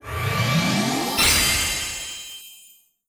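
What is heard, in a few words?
Card Level Up Excellent; Card Level Up Great; Se Sys Card Lv Up Excellent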